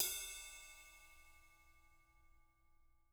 JZ RIDES, Living Drums; Sampleheads; Peter Erskine

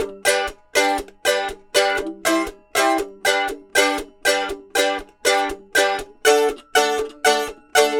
Mandolin Chuffs; Appalachian; Continental Drift Aftershocks

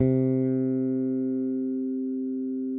SPEC SUSTAIN, Bass

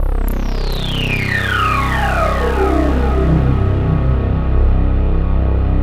SWEEP; Syntec; Wall of Sounds

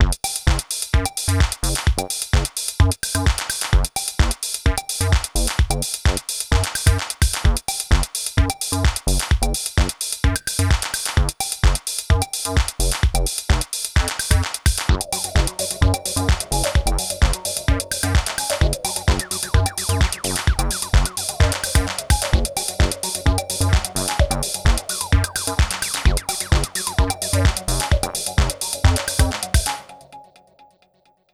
129 bpm, Old Skool House

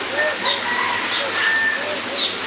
Birds Various

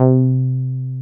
303 BASIC C4; Drums In Your FACE!

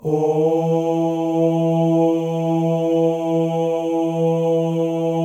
male ohs; Spectrasonics; Symphony of Voices

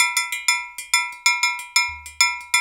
BRKDR, Reggae

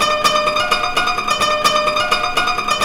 KORA; Zero G - Ethnic

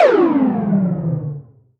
Misc Short FX

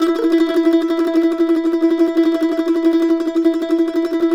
mando trem, Whole Lotta Country; Sampleheads; Whole Lotta Country 1 & 2

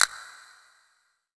Castanet, percussion reverb; MARKYDRUMS

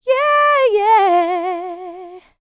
LICKS, Vocal, R&B; ILIO; Dance; LT R+B

